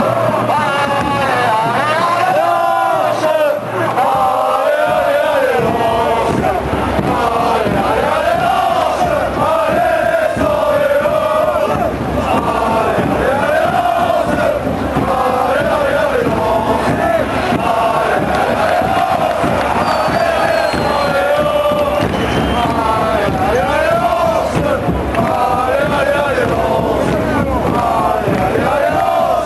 allez allez allez lens, chants

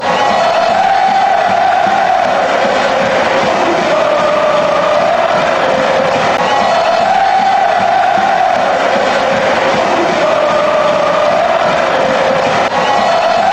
ole, fanchants; italian serie b

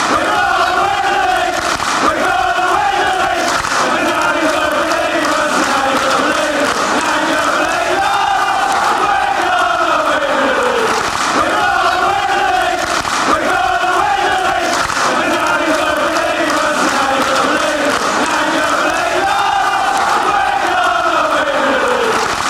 were gonna win league, fan chants; sunderland

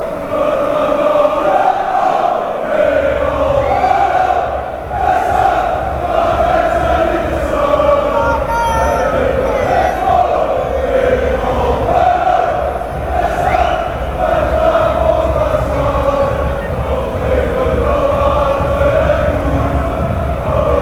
chant; heracles

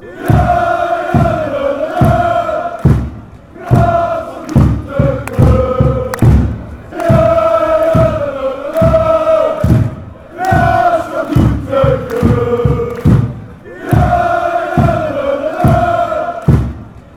graafschap doetinchem fanchants

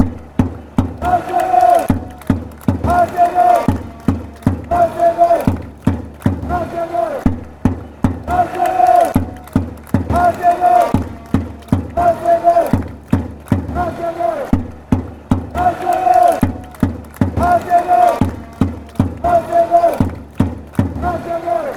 japanese chant

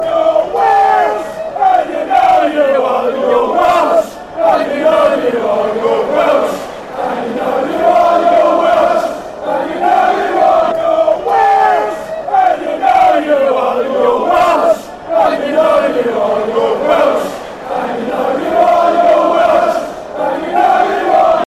youre welsh

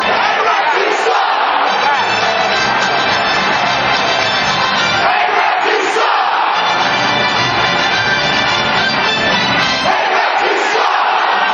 hey ref you suck fan chants

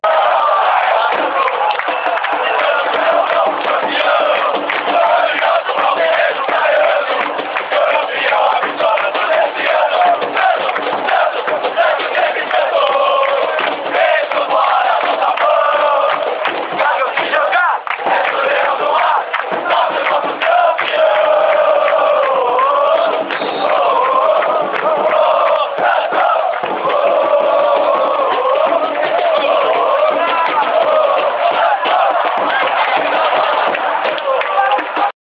hino fan chants; leao do mar

